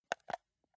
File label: console controller home press slow